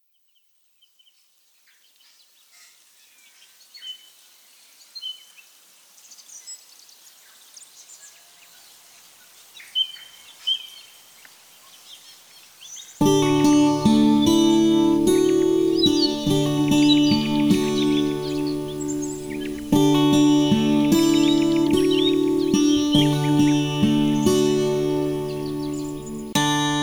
ambiance drums

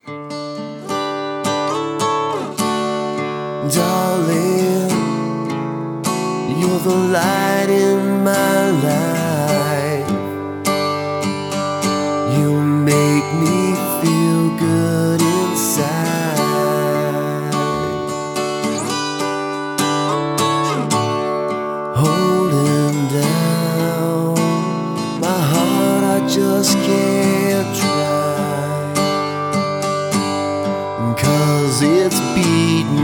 harmony karaoke; music smorgasbord

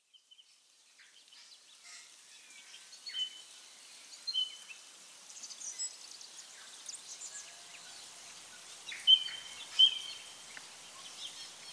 ambiance bass guitar; music smorgasbord

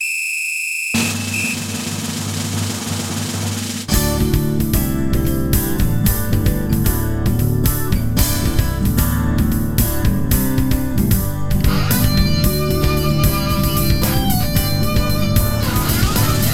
acoustic harmony karaoke

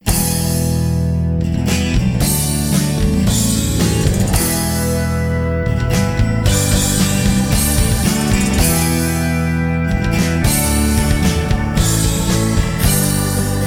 karaoke full mix

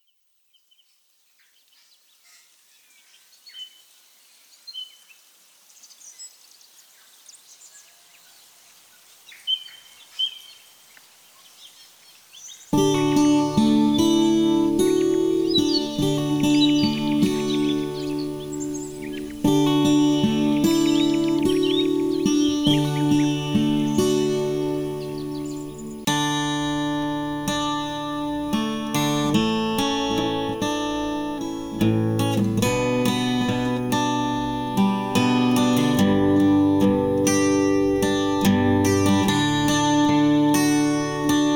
ambiance karaoke

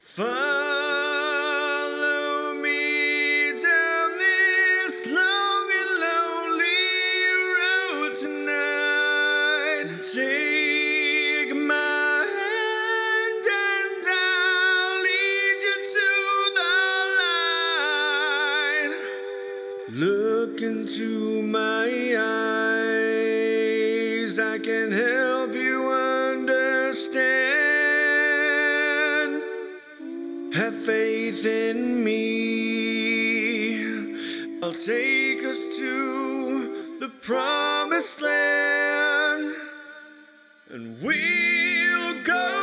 male keyboards bass

